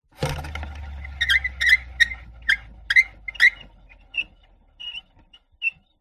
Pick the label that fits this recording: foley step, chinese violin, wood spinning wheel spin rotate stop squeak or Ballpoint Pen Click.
wood spinning wheel spin rotate stop squeak